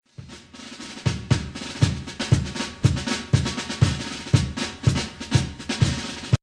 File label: military marches